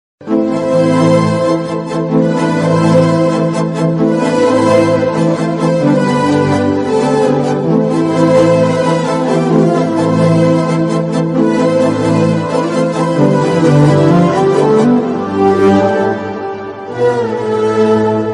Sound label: bgm South Indian bgm ringtone Tamil ringtone; silunu oru kadhal